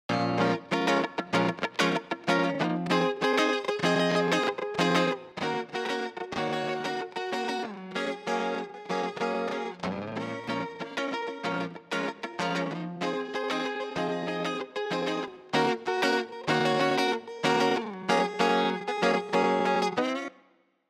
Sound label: DiGiCo S21 Chorus